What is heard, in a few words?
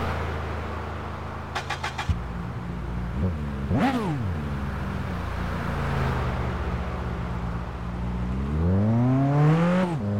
audi s6; abt-edition; TDU